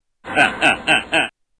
laugh

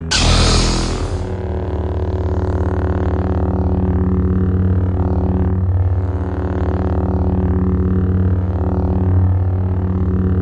lightsaber; sith

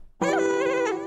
augghh

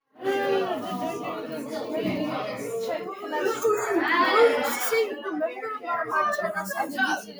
class sound

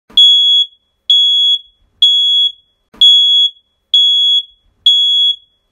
smoke alarm long

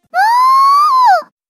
mouuuu

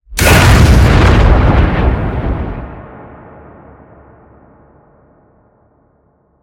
blip warning

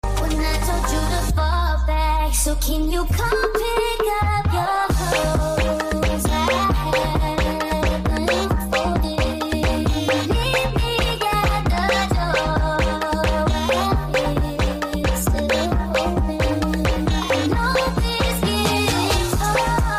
koplo

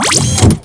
item pick up

